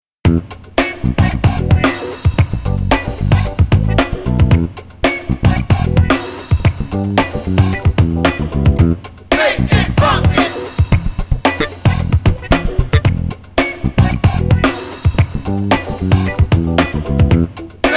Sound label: funky 70's